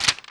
paper; garrysmod

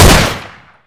new ump; garrysmod

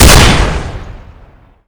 automag; garrysmod

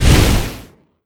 flamer; garrysmod

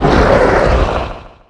new deagle; garrysmod

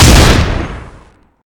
shotty; balrog

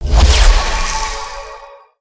phase; garrysmod

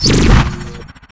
shield on; garrysmod

